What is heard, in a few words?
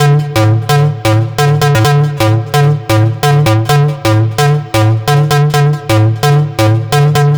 Change Up Bass, EDM; Sonic Foundry (Sony Creative Software); Afterhours EDM